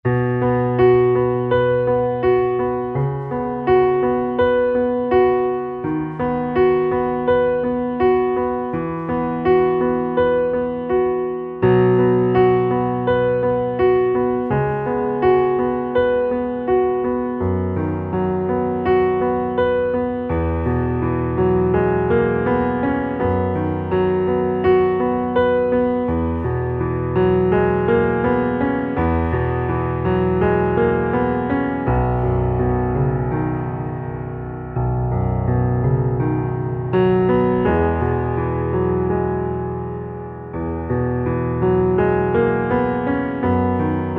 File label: Underscore PIANO; Worship Flow